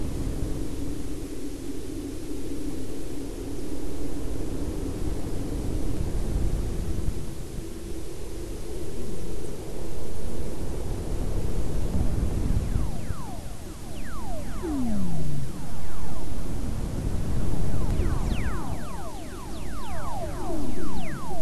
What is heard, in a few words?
WFR audio emfisis